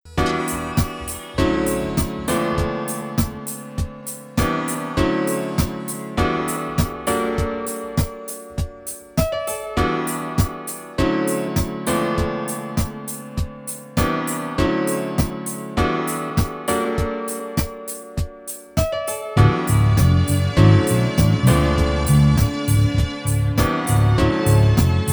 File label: chillout, 432 hz; imprezowo